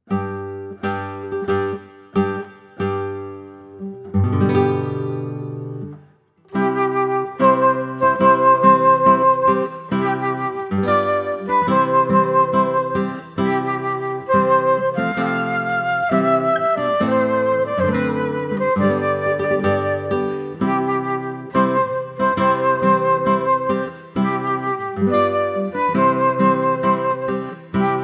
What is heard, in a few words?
Bridal Chorus; Wagner